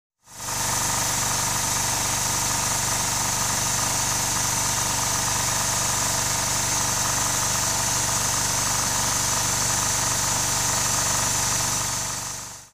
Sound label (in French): Machine Outil; ecrins; Mars